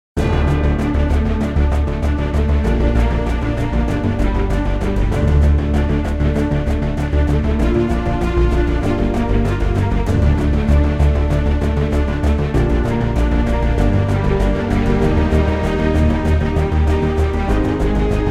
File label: Music Pack eSports Opening; smite